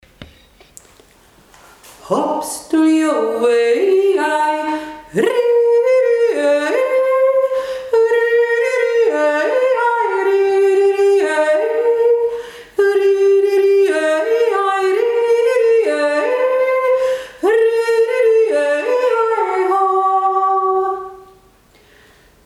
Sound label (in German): hopsduljoe 2 stimme